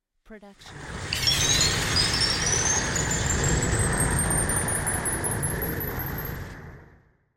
space magic spell; soundscrate

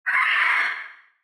dino screech

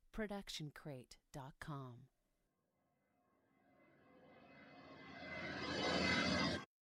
muted cymbal reverse no tail; soundscrate

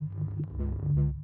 lightsword swing swipes; soundscrate

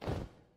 cloth drop

